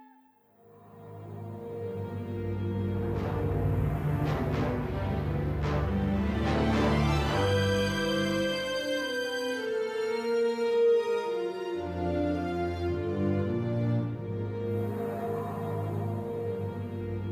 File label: Music Only; Electa Quinney